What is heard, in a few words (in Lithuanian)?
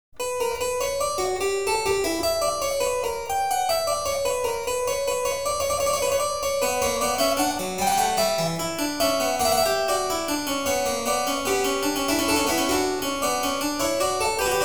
Bach Dvibalse invencija c-moll